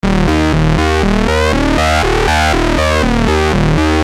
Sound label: Syn-Bass s E, Techno Synth Loops, 120bpm; Sonic Foundry (Sony Creative Software); Signals I